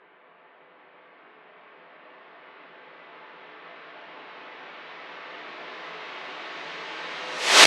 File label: Reversed Riser